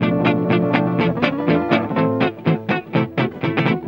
EGUIT GROOVE; Best Service; World Colours

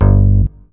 BASS Toronto Bass; Polyend; Legowelt

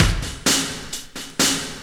straight, Techno Trance Essentials drum loops

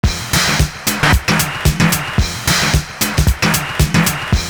ULTRA GROOVE, LOOP; Univers Sons

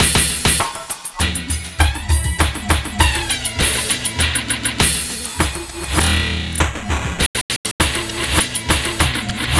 8 Bit Beat, Modular Electronica; Sonic Foundry (Sony Creative Software)

